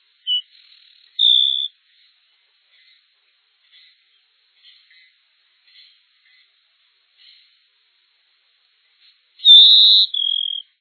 tico-tico-do-campo-verdadeiro

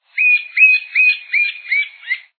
pica-pau-amarelo

